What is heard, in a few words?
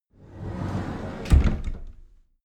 cupboard slide door open regular